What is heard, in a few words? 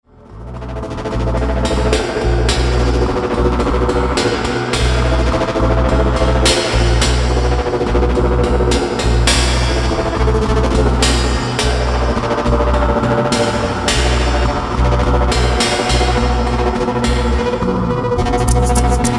Theremin; atelier Theremin; Ararat